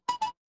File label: ui alert